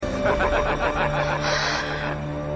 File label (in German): Lachen; X Factor